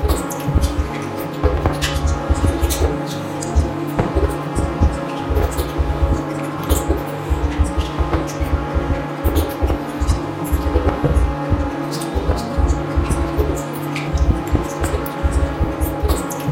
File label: Music Loop